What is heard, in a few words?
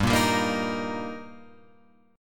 G Minor Sharp 5th G Guitar Open F, chord; strummed; JGuitar